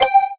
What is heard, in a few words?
Combo